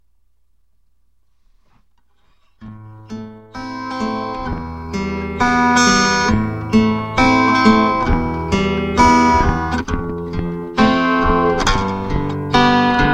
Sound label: BUFF AMP, acpickup